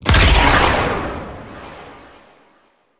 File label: Blade Explosion; Enemies; Warframe; Warframes; Pax Duviricus